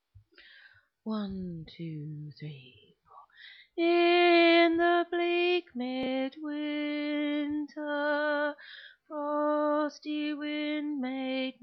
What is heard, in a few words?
Alto